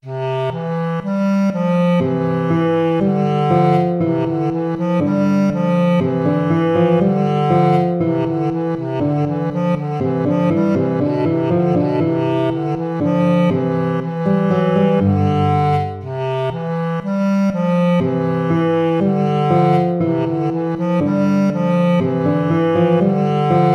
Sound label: allegro moderato; hook james